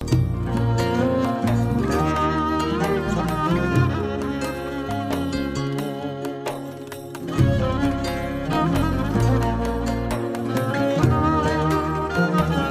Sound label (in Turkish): Enstrumantal